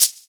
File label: Shaker; Equipped Music; Loungin House